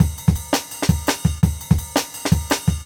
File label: Jungle Breaks